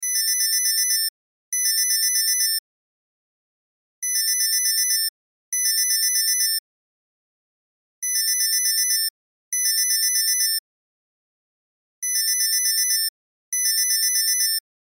Cell Ringtone; Loop; Technology; Cell Phones; Electronics

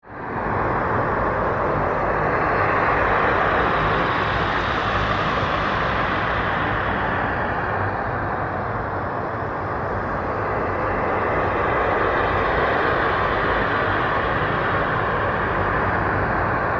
Monster Lair, Horror, Monster, Lair; Monsters (Sound Effects:Horror); BlastWave

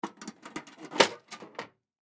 Cash Box Open; Money; Office; Blastwave

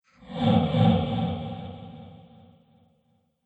Haunting Magic Spell; Haunting Magic Spell 2; Ghost Sound Effects; Andrew Fleming

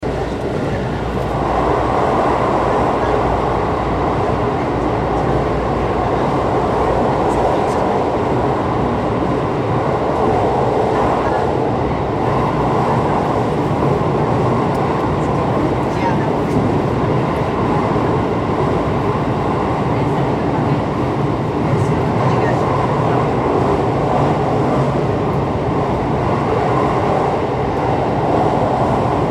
Shinkansen Express Train Interior Osaka Japan; Train interior: Riding onboard a Japanese high speed express; Train Sound Effects

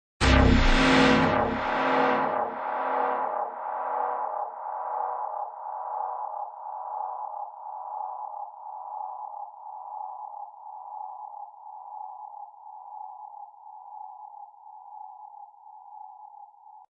Dark Impct, Deepand dark impact hit.

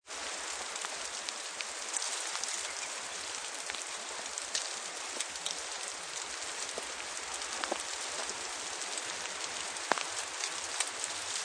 Sound of snow falling through icey trees; Hail; Weather

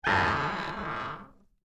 Door Creak Wood, Foley, Door, Creak, Wood; Creaky Doors; BlastWave

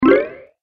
Boost Health, video game

